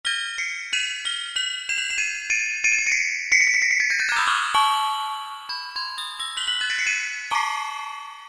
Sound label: Star Chime; Roland PN-D50-04